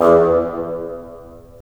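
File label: wind bassoon; LA Composer vol. 3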